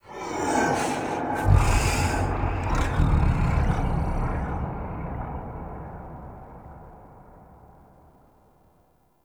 Sky Breaths, Ambience; Warframe; Duviri

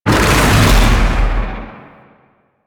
Void Dragon Chomp; Enemies; Abilities; Warframe; Duviri

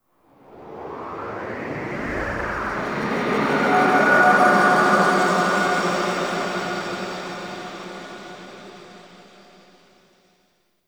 Exit Elevator; Warframe; Nokko Colony; Vendors

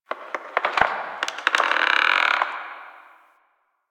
Tree Creak; Warframe; Quests; TheNewWar; Ambience; Drifter Camp

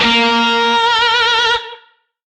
Shawzin A Sharp; Oct Two; Music; DE; Warframe; Lizzie Shawzin

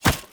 Foley Chest Thump; Characters; Enemy; Melee Grunt; Sinecure

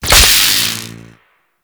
Beam Hit Effect; Warframe; Captain Vor

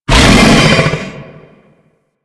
Infested Bone Blade Vocal Mortar; Warframe

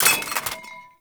Scaldra Grenade Launcher Mag Drop

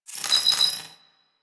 Side Pillar Rand, Small Cargo Room

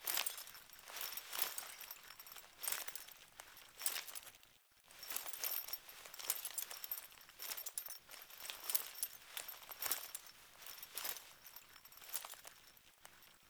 Wolf Steps Jog Loop Rider Foley; Animals

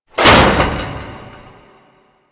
Shipyards Walkway Close; Ambience; Props; Warframe; Grineer Shipyards

